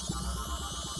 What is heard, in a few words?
key panel active, puzzle; CrossCode